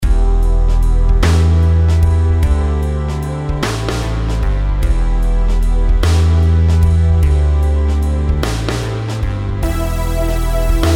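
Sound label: melodies compound 8s E minor; melody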